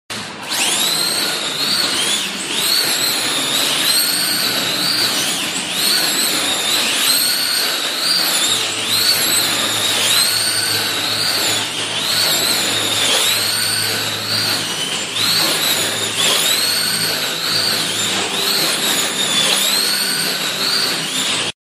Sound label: slot; en blue king euro gr12